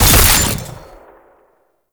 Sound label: Fulmin Prime Alt Shot; Weapons